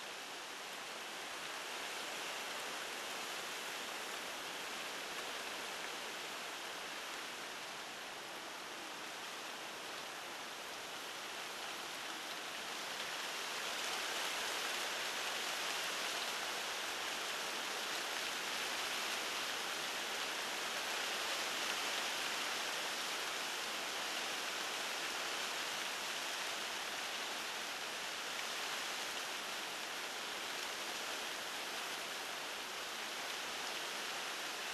Tree Breeze; Blackwater; Titanfall 2

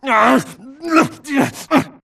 grunt Struggle; Titanfall 2

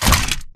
Damaged Torso scrape Concrete

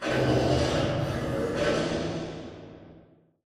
Robot Arm Pass Right Deliver Retract Dist; Boomtown; Titanfall 2

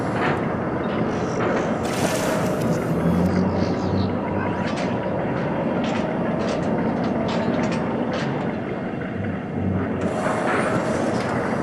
Robot Arm Track Slow Mid; Boomtown; Titanfall 2

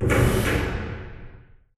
radar tower servo; Titanfall 2

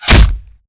bullet light ballistic flesh; Titanfall 2

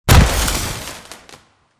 Explo Damage State Heavy; AI Reaper; Titanfall 2

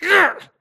grunt death quick; Titanfall 2

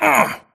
grunt hits; Titanfall 2

